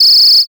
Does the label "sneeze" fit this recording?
No